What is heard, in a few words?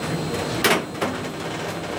turret, tanks; World of Tanks